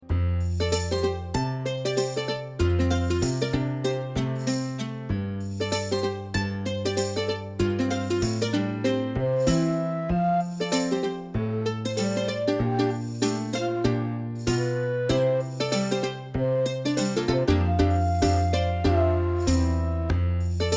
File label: Folk Record